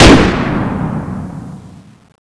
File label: fire, comanche; nst monster